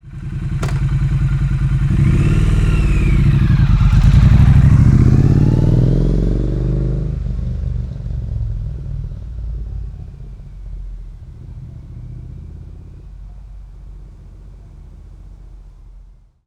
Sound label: Triumph Street Twin motorcycle drive away left to right gear shift, 900 CC; Matt Script